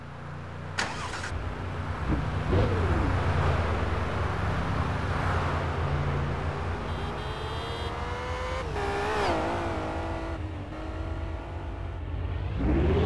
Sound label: hummer h3 alpha 5.3; 2005